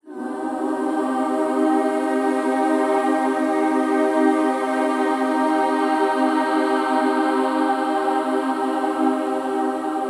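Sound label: Ensembles Swarmed, sustaining a C minor chord in two octaves; vocal samples; Void & Vista; Kontakt; Foundations/Ensembles/ENS 14 Swarmed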